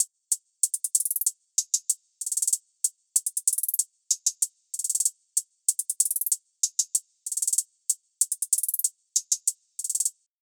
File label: HAT LOOP